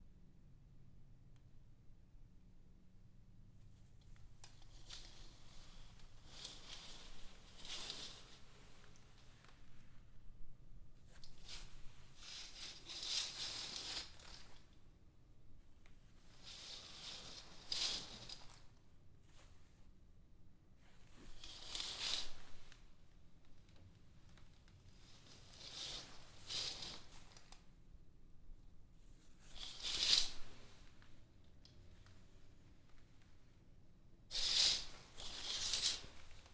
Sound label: Curtains